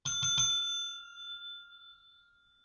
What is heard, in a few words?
ding x3